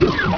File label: DOOR OPEN